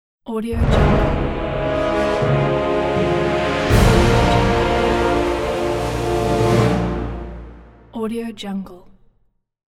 battle combat war logo

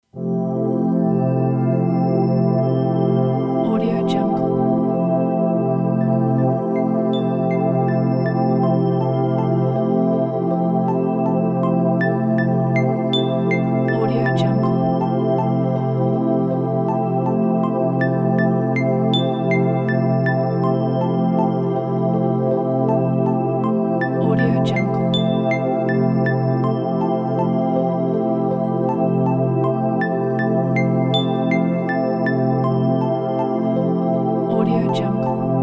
ambient drops

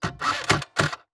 scooter false start; soundbits-soundfx

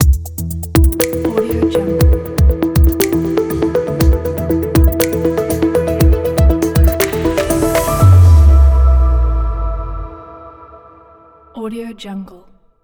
high technology opener logo

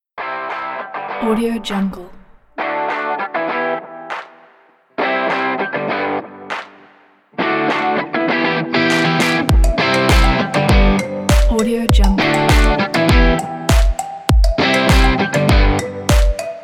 pop upbeat music

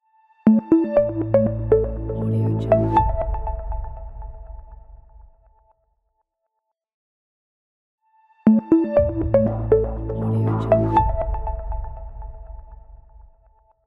simple logo

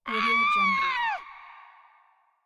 banshee scream

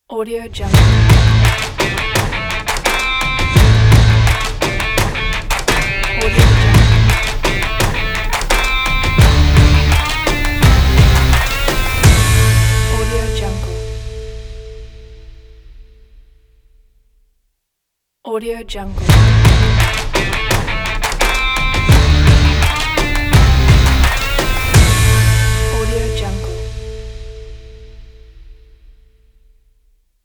powerful rock ident

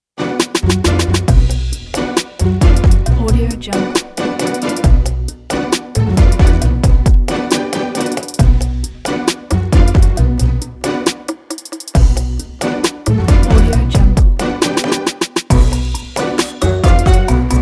quirky funny beat

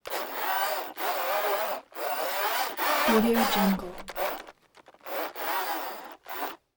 servo short bursts whir mostly slow jerky

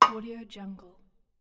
small metal hit

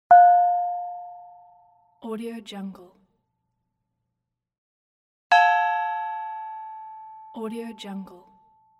small gong; martinwhiskin

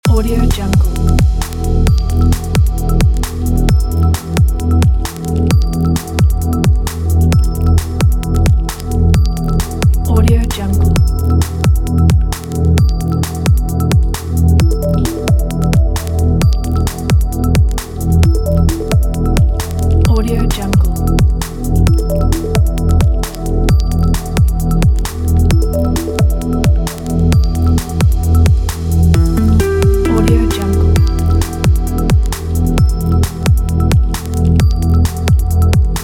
texture breaks loop

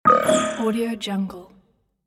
robot error signal design

